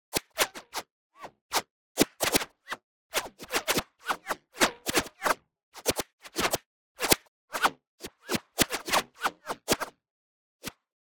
Bullet Passby Whizzby Airy; PMSFX; Bullet Bys &Impacts

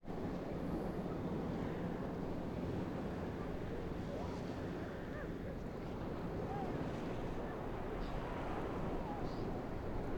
AMB COAST Beach Surf Calm Far; Coastal; Systematic-Sound; General Ambience Series

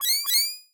Positive Click, Sci-Fi Interface; Sci-Fi Interface Bundle

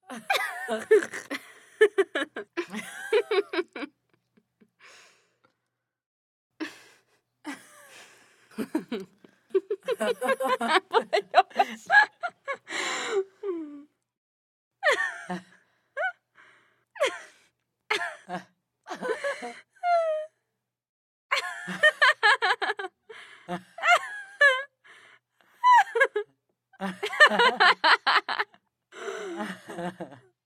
Two women laughing; Laughs

